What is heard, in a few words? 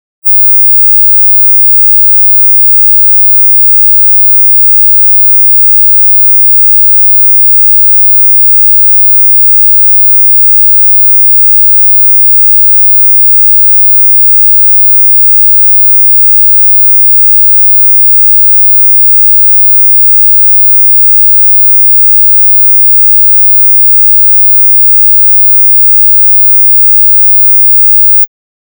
19999hz Sine Wave 0db L+R; Denon Audio Technical CD